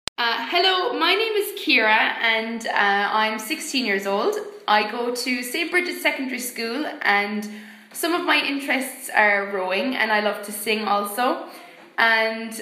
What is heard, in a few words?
irish competition